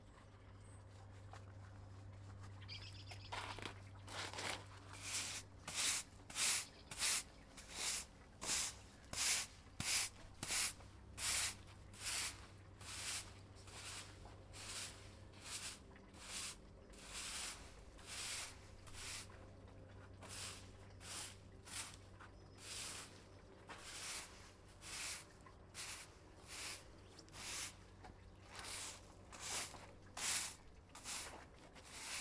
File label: Sweeping Patio With Stiff Broom